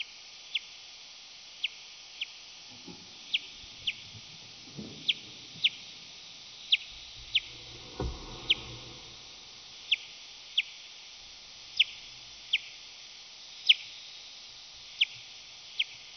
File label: Bechsteins